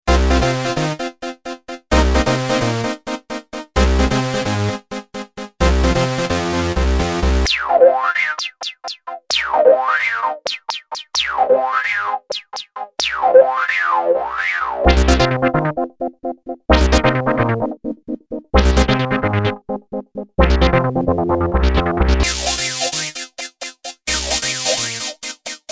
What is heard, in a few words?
filter sequence